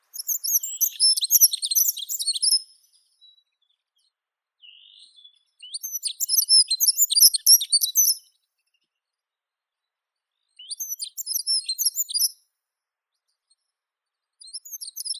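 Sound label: dunnock, Prunella modularis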